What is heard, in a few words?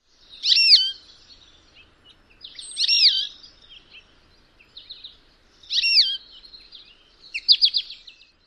nasal call variant num3